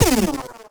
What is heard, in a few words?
lazer fire